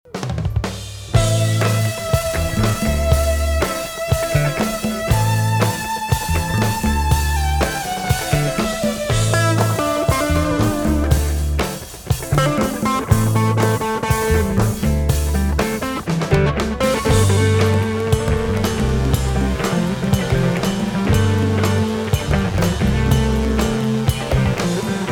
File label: (Instrumental)